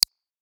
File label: Menu Hover; Martian Chess Audio